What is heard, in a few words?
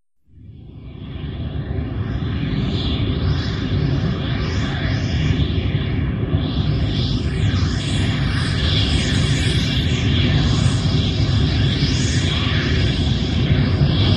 Synth Wind Rumble